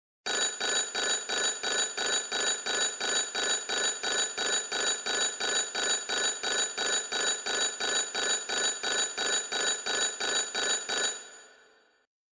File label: Blackberry Quick Bell